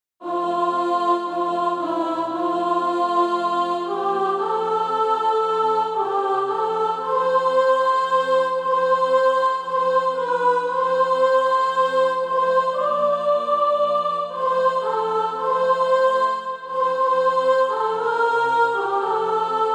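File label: soprano; Eternity Is Drawing Nigh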